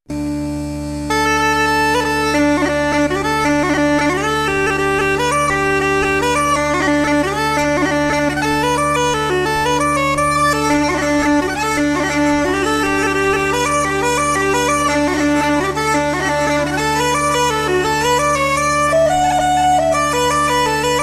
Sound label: jig